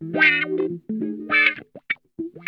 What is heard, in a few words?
MUNCH WAH, Funk Guitar; Zero G - Funk Guitar